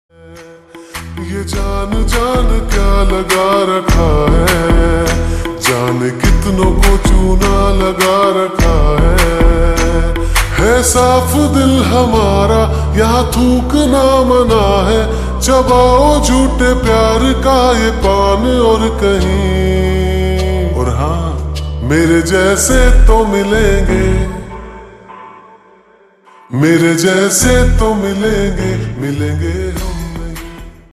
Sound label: Slowed Reverb Ringtone